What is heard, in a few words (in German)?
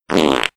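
lustige Lachen Klingeltöne; diddi lache; furz sound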